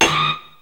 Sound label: SUNBURST; BEAT2000